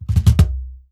WORLD DRUMS, MIXED DRUMS; WORLD INSTR 2

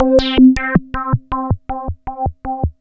SQU FILTER

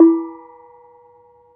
KETUK, Bronze Gamelan

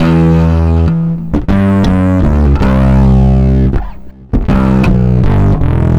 Distorted Bass, Electric Bass

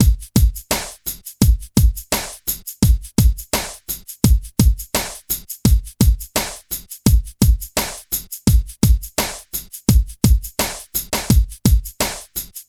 HIPHOP; INIS; Funky Xtreams

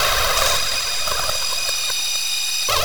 loop, techno atmos

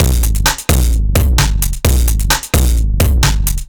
Beat Valve 130, distorted drum loops 130bpm; musicradar

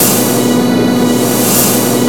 INDUSTRY HIT; Rubber Chicken Software Sample Bank

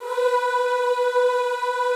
B3 R, Classical Choir; Best Service; Extended Classical Choir

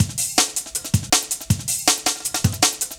Break, Loops; M8

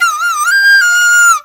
CHINA, Heart of Asia; Spectrasonics; CHINAFLT

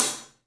LITE SAB HAT; 3D Sound; 3D Drums

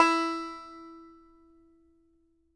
banjo E4, banjo notes, country; Sampleheads - Whole Lotta Country 1 & 2

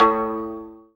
ZIMBAL A 1, ZIMBALON

